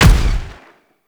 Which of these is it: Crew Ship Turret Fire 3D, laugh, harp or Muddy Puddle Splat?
Crew Ship Turret Fire 3D